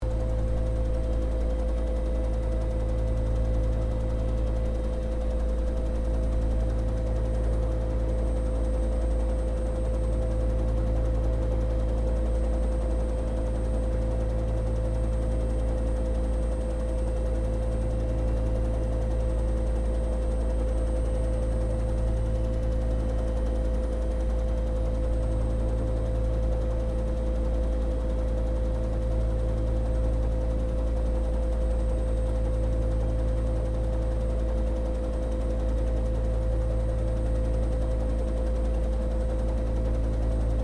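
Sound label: helicopter Bell UH1 Iroquois idle interior; RAN UH-1 Iroguios; Helicopters (Sound Effects:Vehicles)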